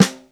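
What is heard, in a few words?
snare dry, Yamaha Motif ES drum machine; 300 Drum Machines; Yamaha Motif ES-Series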